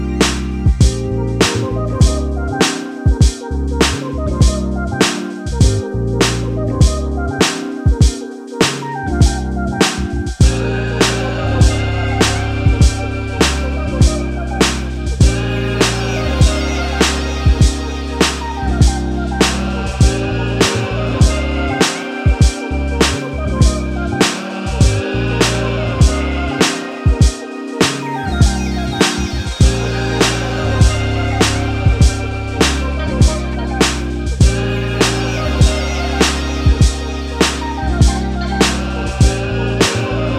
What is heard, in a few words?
Drums Please